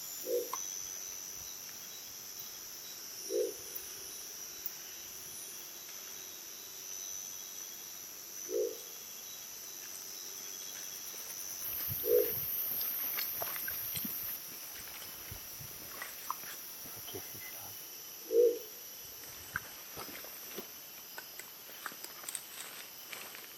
Crested owl; جغد کاکلی

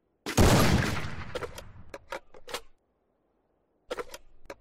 Gun, Free Fire; بازی فری فایر

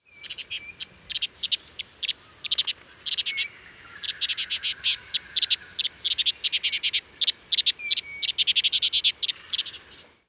spotted scrubwren

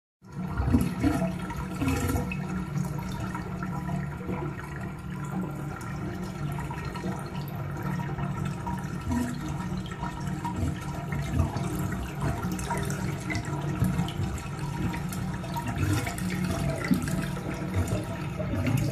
bathtub

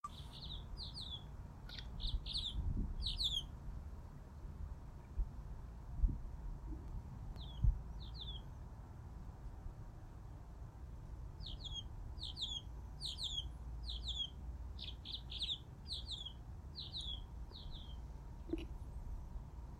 Cuban martin

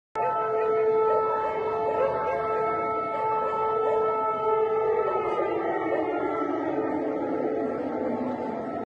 alarm siren in Tehran; آژیر خطر جنگ; تهران

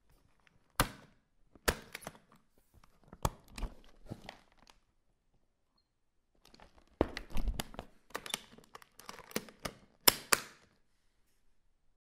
luggage; باز کردن چمدان

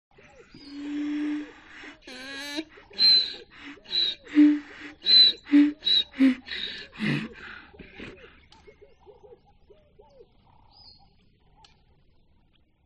donkey sound

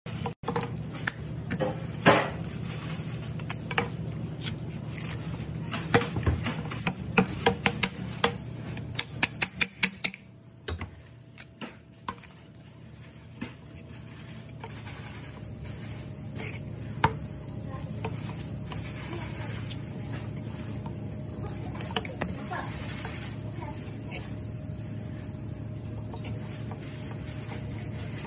Cut meat, شقه کردن گوشت و استخوان

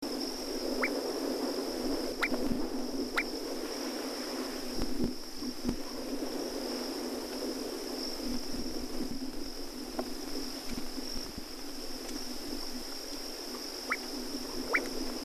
inland dotterel

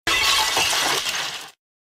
Flower pot, شکستن

